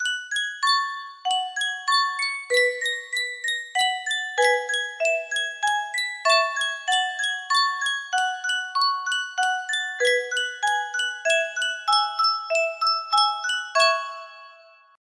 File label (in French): Sankyo boite a musique